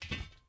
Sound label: ui pickup metal bars; Rust